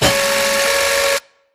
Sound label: Screen Glitch Long; Boomtown; Titanfall 2